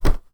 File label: hit, Impacts; OwlishMedia; SoundEffectPack